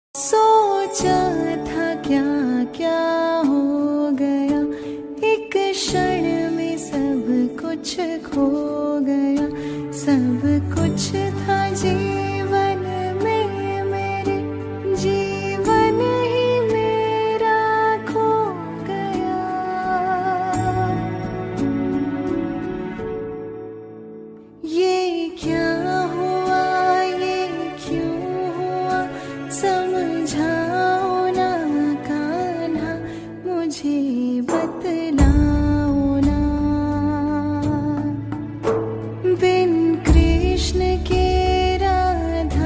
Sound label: Krishna Bhajan